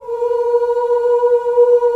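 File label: C4 FEMALE CHOIR, OOS; Northstar - New Gold VOL-2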